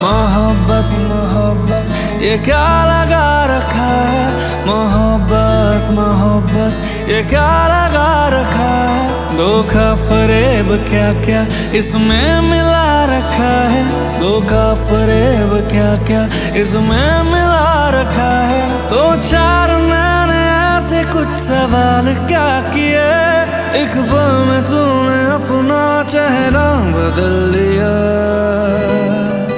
Ringtone

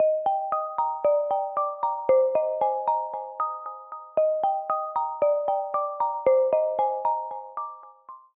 Instant Calm

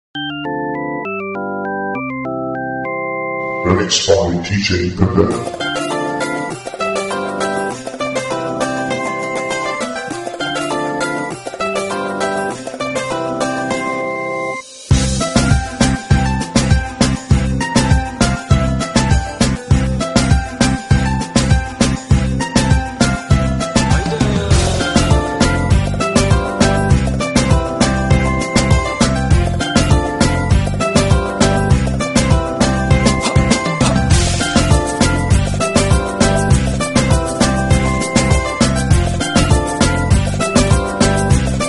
nokia tune turkish remix

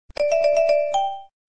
Love Rain Cute Msg

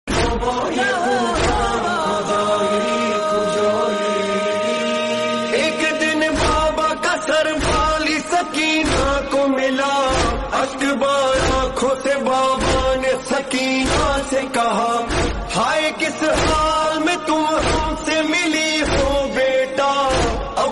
Islamic

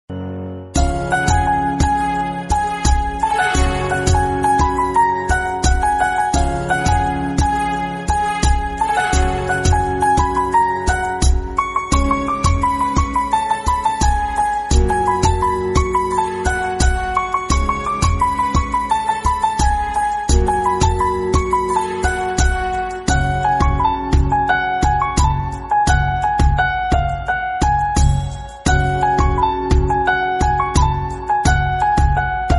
Bgm ringtone

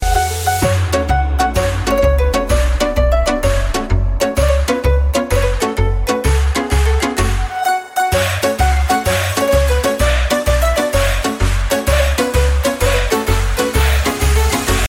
tarantella electro

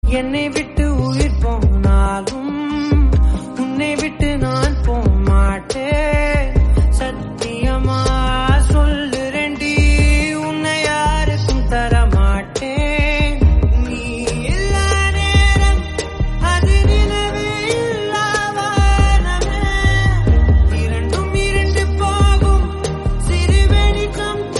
Tamil Song; Love Today

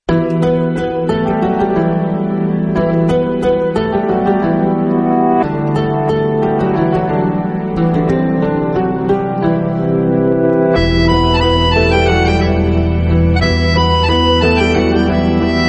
Instrumental Ringtone

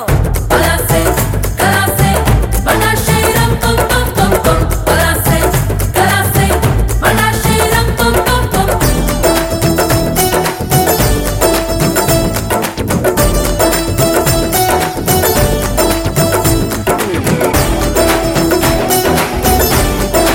Bg Bit Telugu

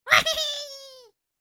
Angry Birds Lauging

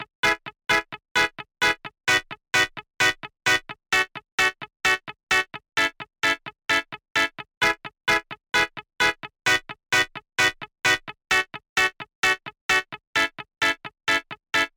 Ska Loop

